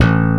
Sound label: BASSES; Kid Nepro - Elite